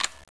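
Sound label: weapon hud on; svencoop; sence; mistake coop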